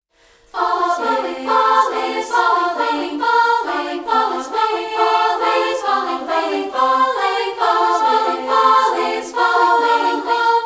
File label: Fall Is Falling A Cap